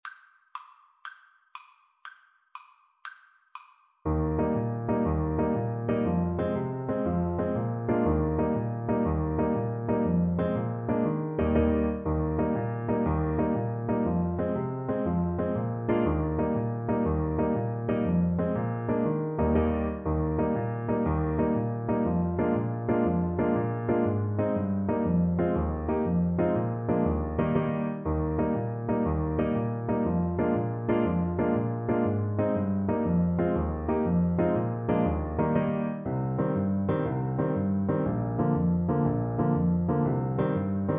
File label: irish jig medley, Clarinet